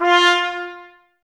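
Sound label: trumpets F, brass; 90 s Sample CDs; Miroslav Vitous; Woodwind Brass Ensemble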